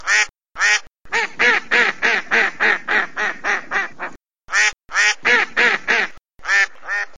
Duck sms

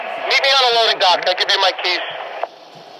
loading dock

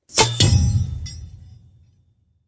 Headshot

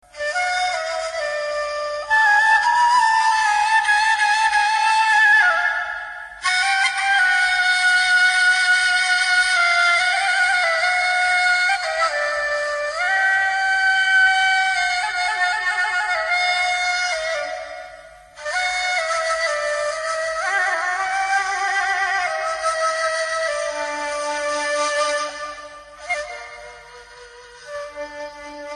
arab flute; country

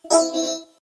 be hee

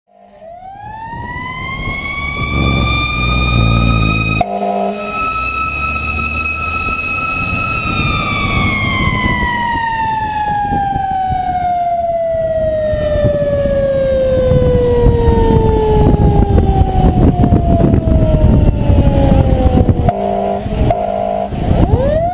engine; 74-3 to fuel spill

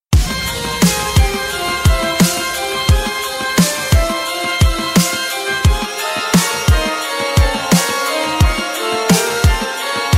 Bach Remix

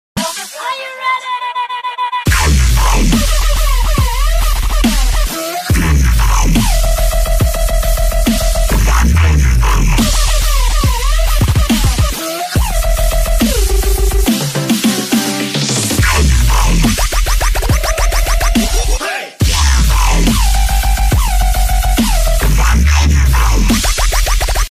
Dubstep Are You Ready

